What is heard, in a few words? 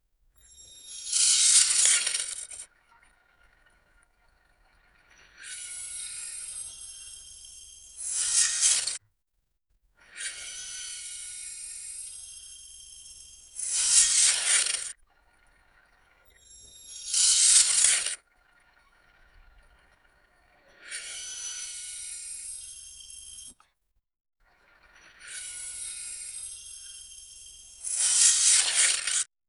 variations of old operati, boop